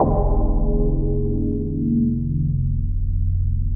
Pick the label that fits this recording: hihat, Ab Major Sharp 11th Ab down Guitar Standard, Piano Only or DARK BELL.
DARK BELL